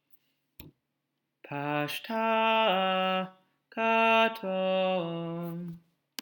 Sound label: Torah Trop Pattern